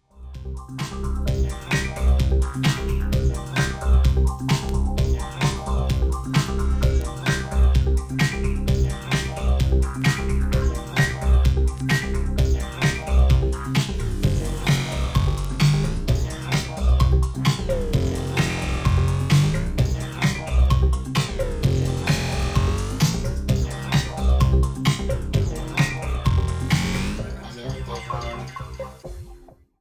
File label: dino knocks